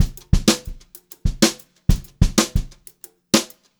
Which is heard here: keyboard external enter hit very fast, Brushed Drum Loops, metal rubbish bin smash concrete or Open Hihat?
Brushed Drum Loops